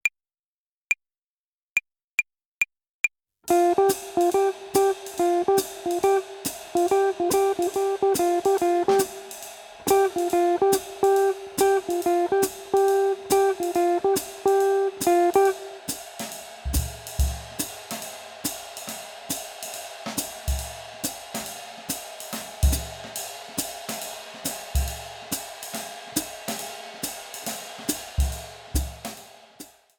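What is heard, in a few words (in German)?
Swing Phrasierung Übung; play along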